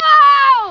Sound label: gbigslip; mk2